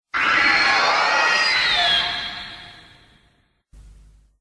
roar; heisei mothra

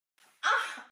ugh

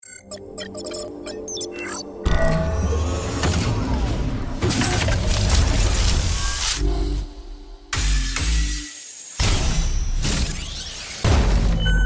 gacha sound; nikke

